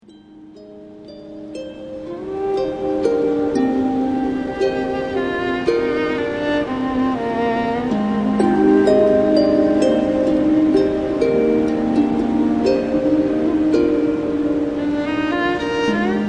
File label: harp song; The Sunday Times - The Month